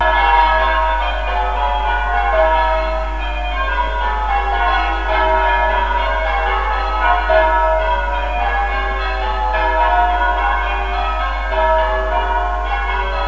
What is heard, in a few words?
church bells, Bellringing at St Marys Church; Felmersham